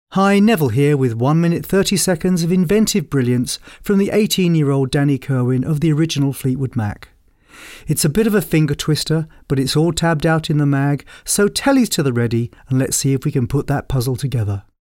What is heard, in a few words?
blues; Guitartechniques